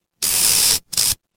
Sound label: fire electric zap